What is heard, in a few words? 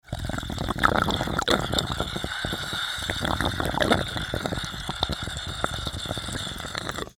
straw